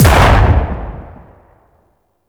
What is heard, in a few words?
Quad Sniper Shot; Warframe; Weapons; Tenno